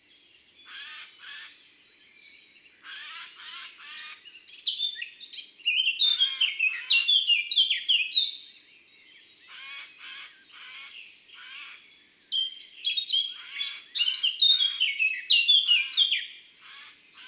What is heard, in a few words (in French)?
Geai des chenes Chants d'oiseaux dans la campagne